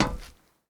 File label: Footsteps Iron plate One